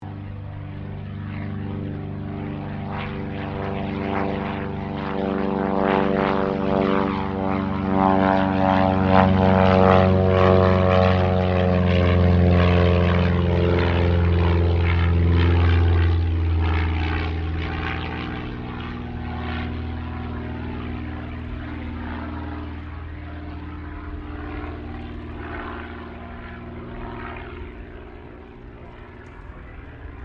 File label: AIRCRAFT CT4A TAKE OFF, military trainer aircraft; Airplanes; Vehicles